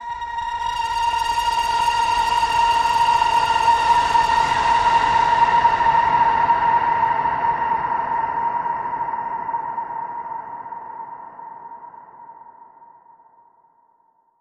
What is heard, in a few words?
Black Hole Death Whistle